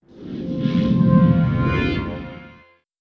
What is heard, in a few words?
Shutdown; MinderiaOS Beta 2; Minderia Soundpack MinderiaOS; Mega WNR Pack Collection 2018-2022